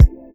kick, ragga dub reggae

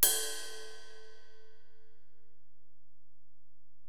Ride, Alesis DM-PRO

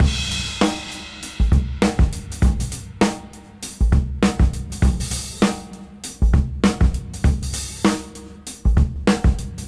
Swing 99 BPM; AMG - Keith LeBlanc Phat Butt Jams

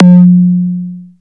NOISE; DRUMS & PERC

